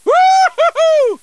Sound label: woohoo; deadpool